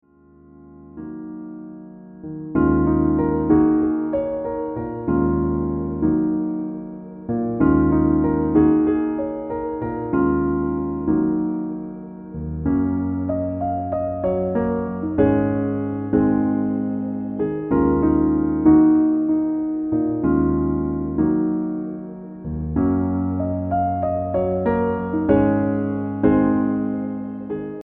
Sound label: classical piano